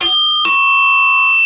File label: ding dong bell